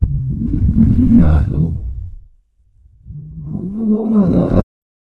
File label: jingle